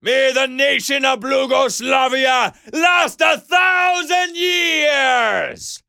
charge

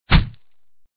bullet hit; Weapons; Guns Hits

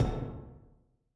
catwalk, Footsteps

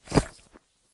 spurs, Footsteps

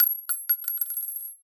casing drop

